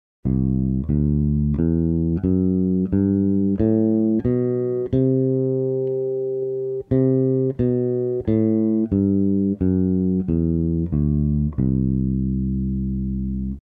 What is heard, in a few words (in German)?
lydisch